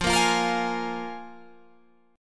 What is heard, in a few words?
F Minor Double Flat 5th, Guitar, Open G